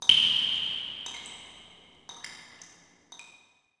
PIPES, pipes; zones

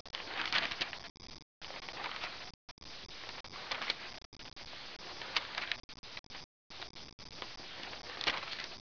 pg turn; 12000 Graphics Pack - A Complete Graphics Collection For Your PC

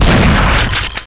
shotgun; Cube

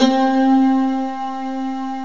standing ovation

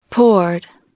poured

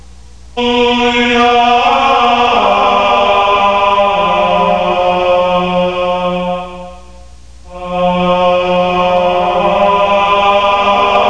chant; Cyber Strip Poker

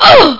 UGH

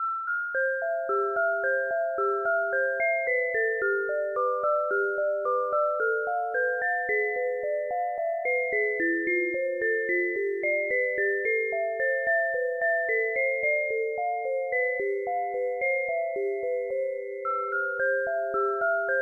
moog; PlayerPRO 5.8 X; Musics Examples

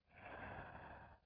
breath out; Panic Volcano; Mountain

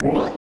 powerup short

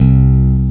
Fingered Bass C2; Hexen Demo; Hexen Music; Hexen 6vox

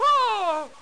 M Fall

